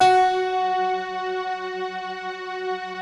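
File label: bright electric piano strings; Optical Media International - Sonic Images Library; Slopiastring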